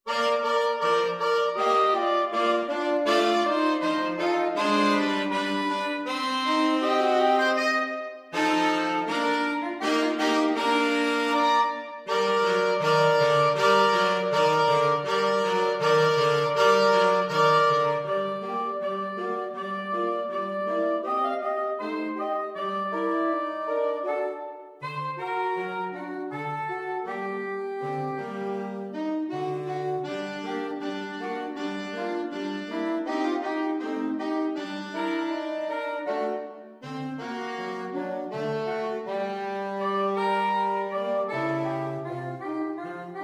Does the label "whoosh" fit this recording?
No